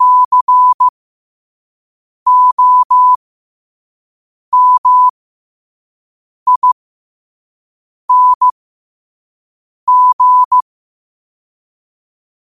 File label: 5 wpm, morse